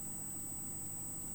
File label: Hydrophone